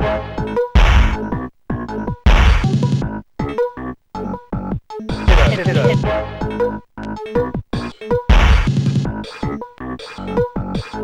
rap song; SoundFX

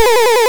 sid MOS6581R2

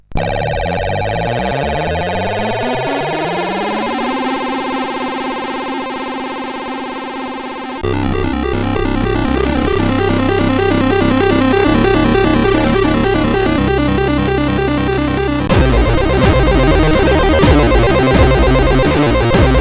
CSG8580

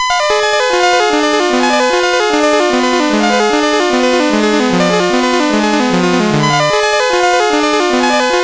sid CSG8580R5

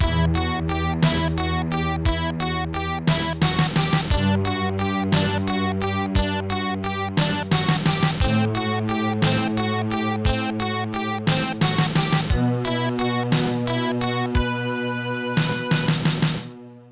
ballade; mcp-ballade 3; Sonix Music Driver

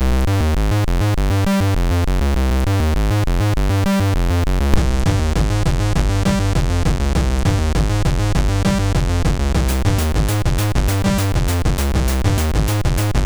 Techno Break, MOS6581R2; sid

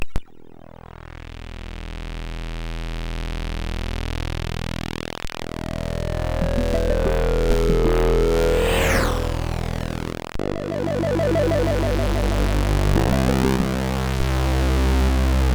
sid MOS6581R3; Heavenbound

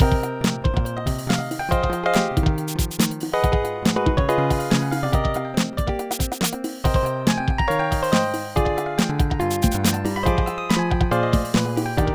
solo; Dr. T's MIDI Recording Studio

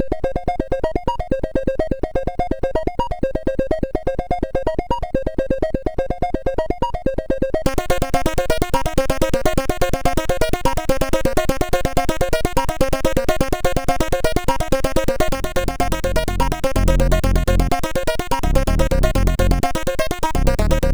sid MOS6581R4; Da Blue Mooses Dream Tune

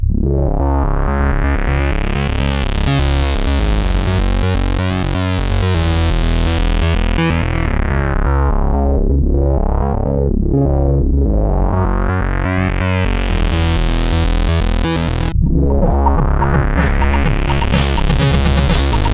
Filter Crap, CSG8580R5; sid

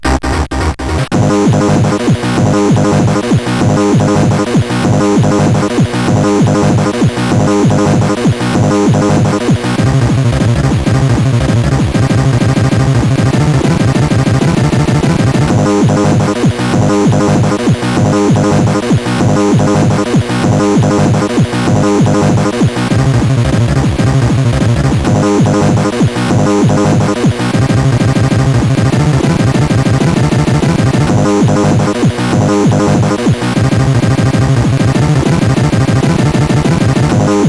techno, CSG8580R5; sid

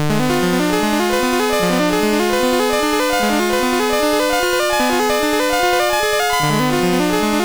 sid MOS6581R3

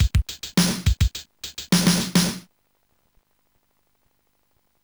drum; Sonix Music Driver

